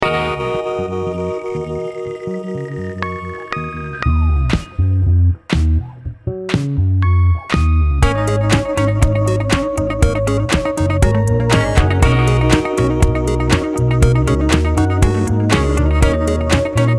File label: Space - Ghost Tone; Tanuki Sunset